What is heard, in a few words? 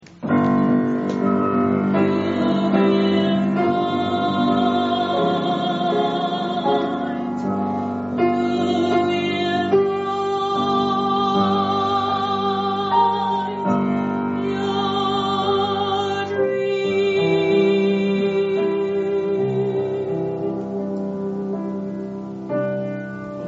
alto